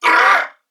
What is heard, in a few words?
Pain Corpus Venus Male, en; Dialog; Barks; Battle; Warframe; CorpusVenusMaleB